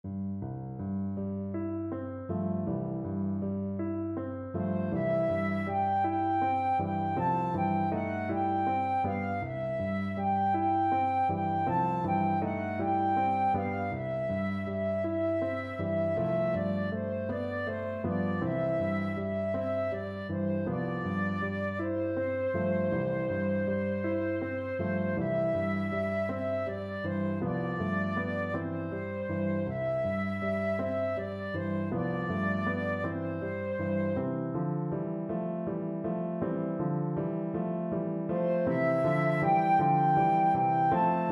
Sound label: lullaby flute